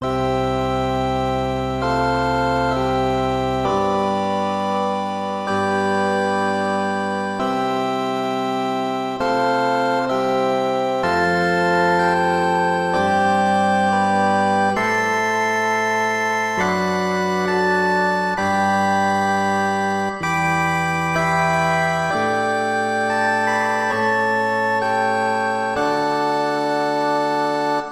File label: Church Organ Background Theme